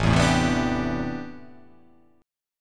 A Minor 11th A, Chord

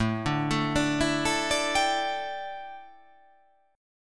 A 7th Suspended 4th, A, down

